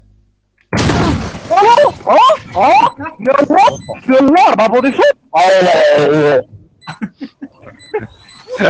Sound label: haaaa haaaaa